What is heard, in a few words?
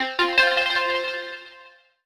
JUMPPAD; impact; JUMPPAD 3 B